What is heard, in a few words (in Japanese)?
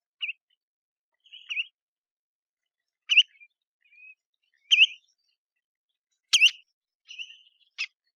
tsurushigi